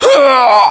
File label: death; ModernWarfare; localized english